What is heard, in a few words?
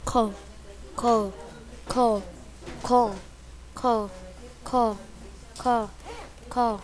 cough